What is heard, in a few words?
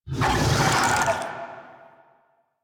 Infested Big Ashen Vocal Attack; Warframe